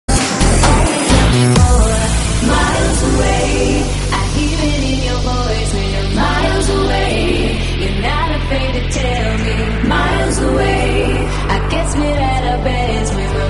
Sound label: ringtone, remix